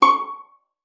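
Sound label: violin pizzicato C#6; Xsample VOL-5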